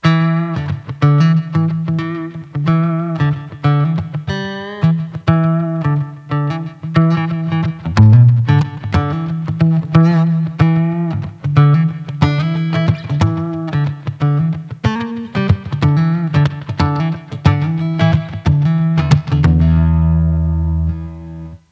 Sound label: Slapback Delay with Reverb, guitar; slapback delay reverb settings